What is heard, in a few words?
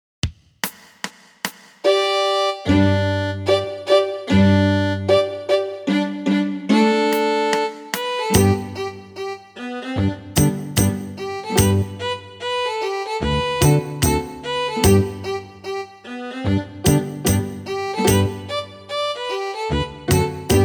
American Fiddle Tune